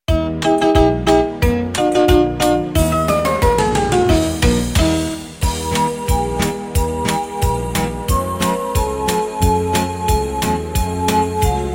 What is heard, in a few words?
beat instrumental